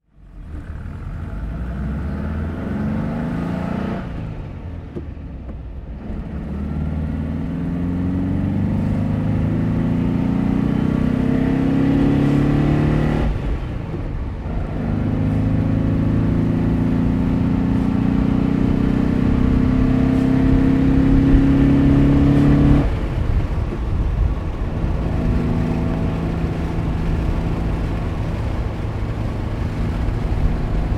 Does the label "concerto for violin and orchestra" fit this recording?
No